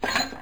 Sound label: open